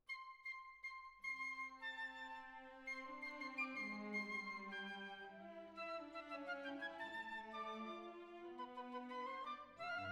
Violins Over

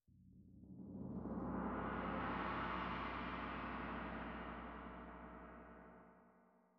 Tam-Tam Front

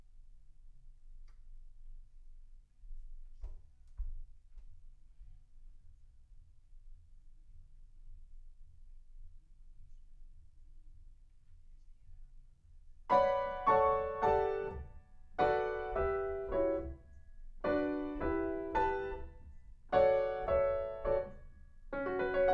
Piano Room

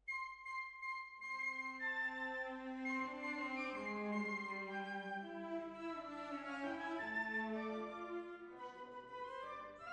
Hall

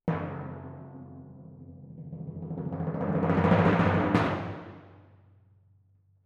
Twin F Timp

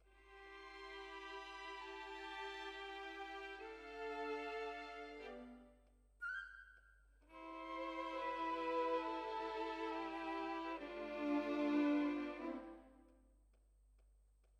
Violins, jazz for piano

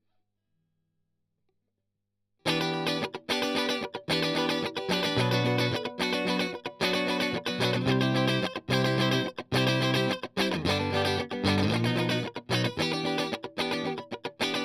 Strum

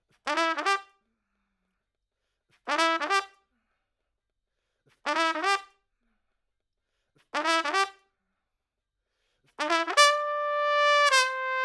Trump

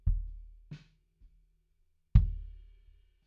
BD In

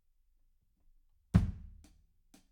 Room A

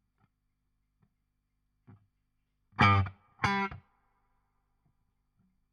Guit Solo Back

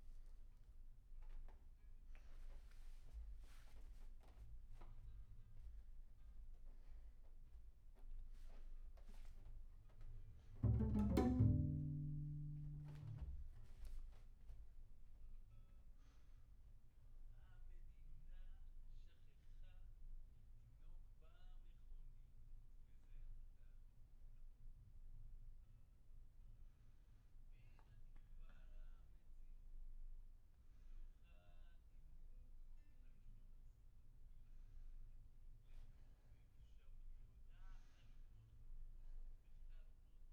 Bass Room

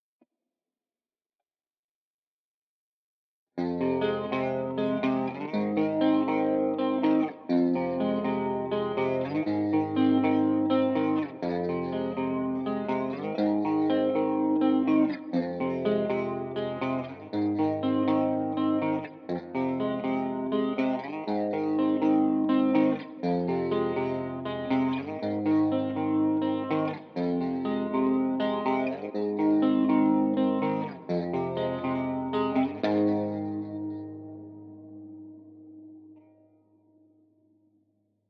Guitar

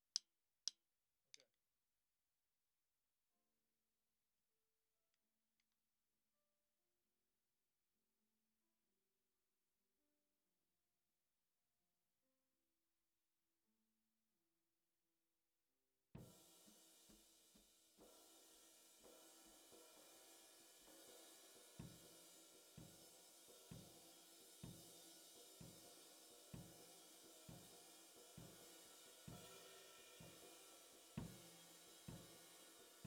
SNR

